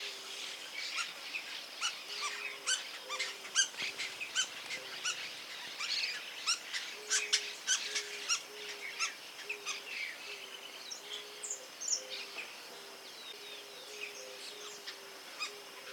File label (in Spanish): carpintero del cardon voz; aves